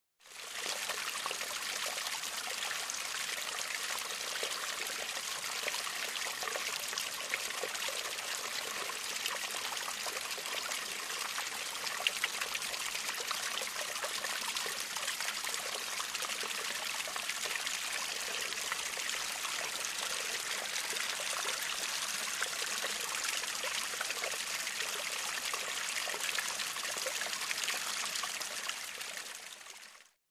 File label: Stream 1 Small Trickl, streams